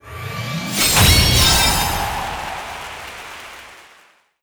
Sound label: Timeattack Final Score; Disney Star Smash; Android, iOS, Mobile